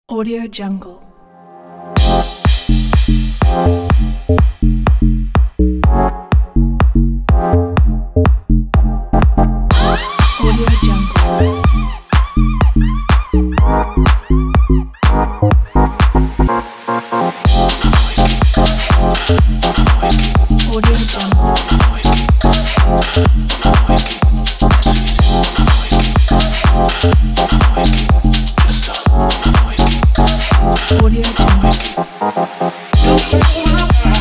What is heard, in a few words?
house for fashion